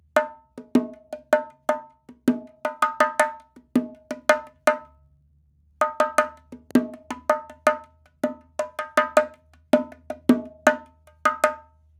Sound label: afrobongo loop 80; perc construction loops; bongos afro; SEISMIC Drum Sample Pack; Slate